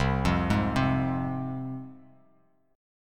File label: C Suspended 4th Sharp 5th, C, down, Bass Standard; Csus4#5 arpeggiated; JGuitar